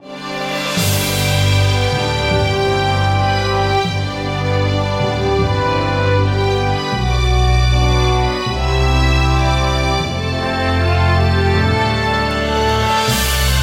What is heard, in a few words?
GuitarMusic